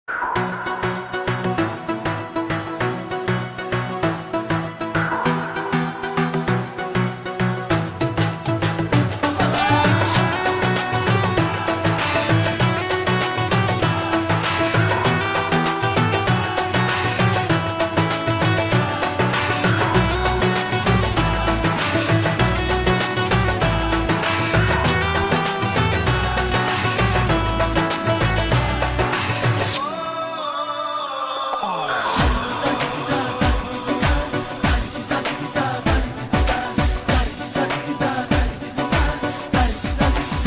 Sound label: music turkish